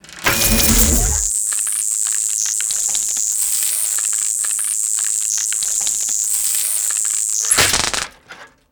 laser; Cosmic Rage

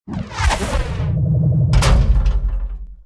Salvage, salvaging; Cosmic Rage; The Experience-light; ships

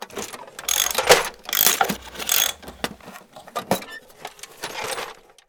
repair; Cosmic Rage; The Experience-light; ships; Ratika

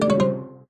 logout; comms; Cosmic Rage; The Experience-light

